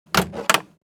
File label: Toolbox open close utensils